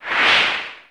pose

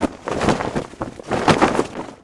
cloak; barok

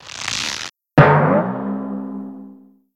bounce

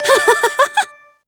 surprise

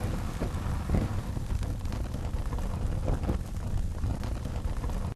flame

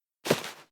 transform

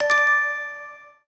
surprise

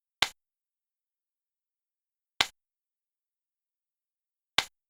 gavel touch; seishiro

